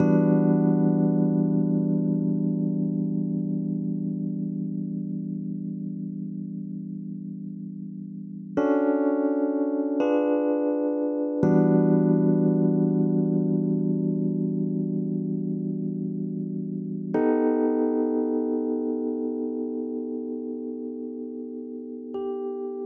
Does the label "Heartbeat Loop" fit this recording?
No